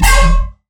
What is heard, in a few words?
bass hit Hollow Yoink E; Virtual Riot